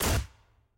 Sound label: skill hit; sett